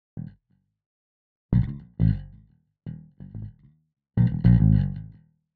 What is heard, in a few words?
Gate bass Bass Tight; SSL X